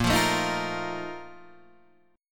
A sharp Major 7th Suspended 4th, down, Guitar, Standard; strummed; JGuitar